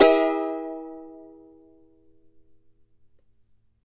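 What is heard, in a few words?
charango F major down; Spectrasonics; Hans Zimmer Guitars VOL-2; CHARANGO CHD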